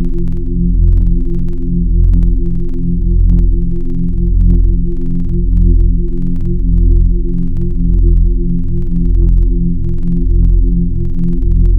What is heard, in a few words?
Leslie Organ; Synthetic's Serum Collection; Chill Trap 2 Tables